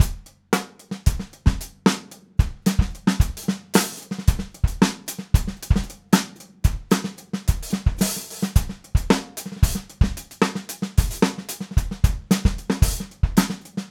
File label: Spatial Motion mono Funk Drums Loop